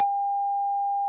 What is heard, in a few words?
Xylophone C4